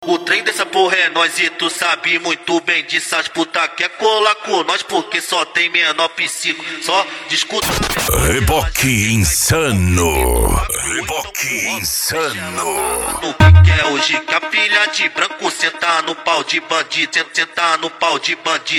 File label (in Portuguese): noia dance e funk mega bass